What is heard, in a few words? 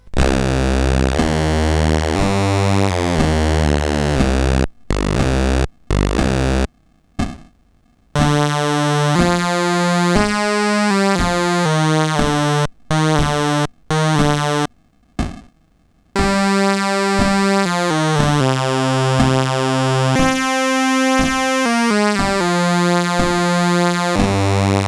sid, CSG8580R5; Race of the Bones